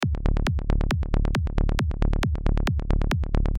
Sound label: bassline resampled